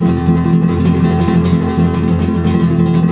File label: F# MJ, Guitars; Spectrasonics; Hans Zimmer Guitars VOL-2; FLAM TREM CH